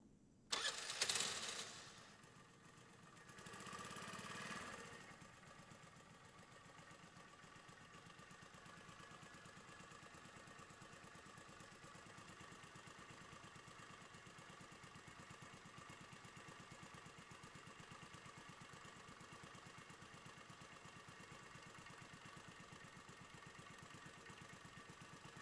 Motorcycle - Moped - Indian - Tvs Scooty - Button Start - Idle - Shut Off; The AudioVille; Motorcycles of India